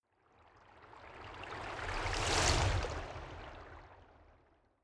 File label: whoosh slow